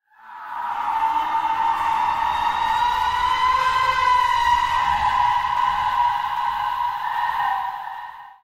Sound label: cave, ambient; minecraft